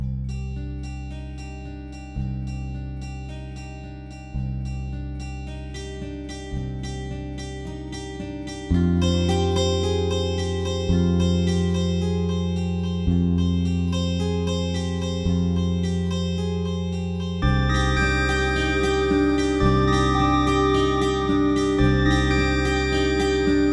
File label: Walking; Arrest of a Stone Buddha